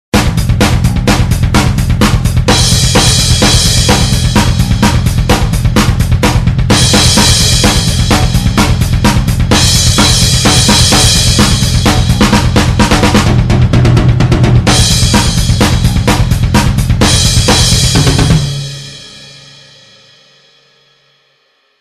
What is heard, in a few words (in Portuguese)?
baterista metal